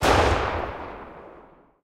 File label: mg 8mm maxim4 lastshot; guns; tanks; War Thunder